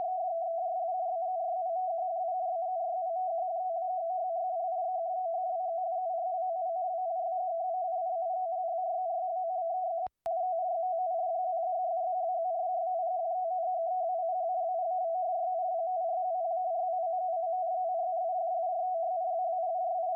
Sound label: Military RUS 18.1khz; M32